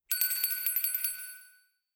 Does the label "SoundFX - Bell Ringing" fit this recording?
Yes